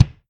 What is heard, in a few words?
Kick